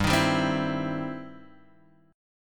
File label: E Minor Major 7th Sharp 5th G